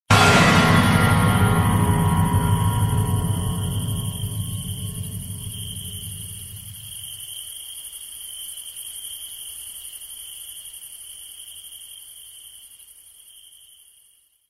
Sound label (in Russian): dikij zvuk rastroennogo pianino